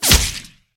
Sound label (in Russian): lazernij skrip pronzivshij mishen